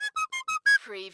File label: CARTOON RECORDER MELODY